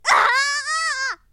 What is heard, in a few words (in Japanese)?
shonen himei